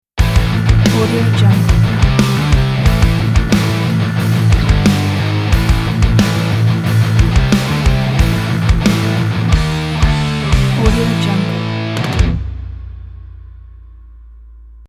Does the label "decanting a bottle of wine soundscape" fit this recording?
No